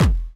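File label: snare drum bass drum, Dance Mega Drums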